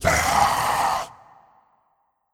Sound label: Hit Summon monster